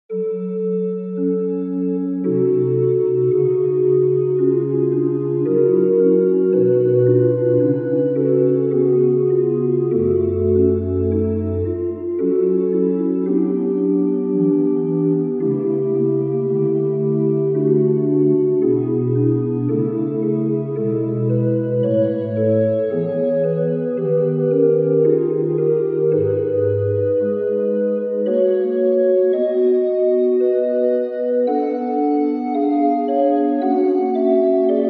chorale in f sharp minor

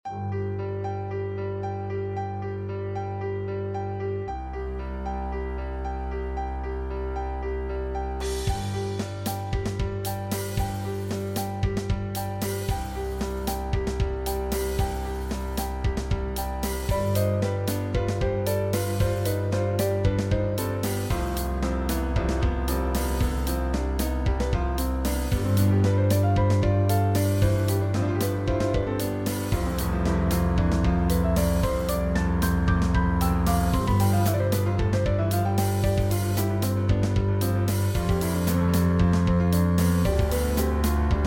Quartet

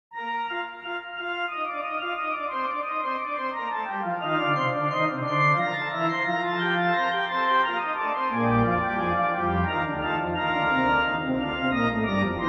Fugue in Bb Major

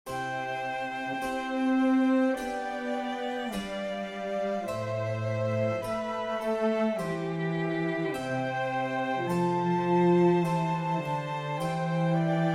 Trio sonate C Grave

